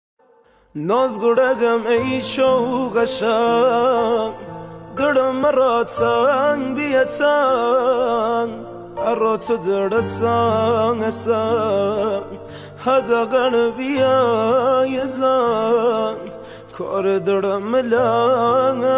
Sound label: Version Piano